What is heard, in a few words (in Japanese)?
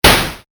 ダメージ レトロ モノラル